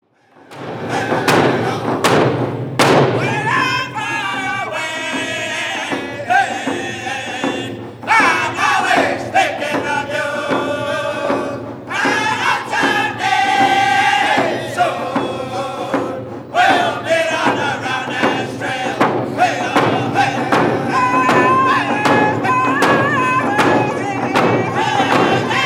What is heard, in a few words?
Round Dance